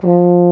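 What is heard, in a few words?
brass baritone F2; Roland - LA Composer vol. 1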